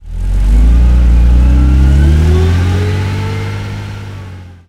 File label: centurion engine load, tanks; engines; War Thunder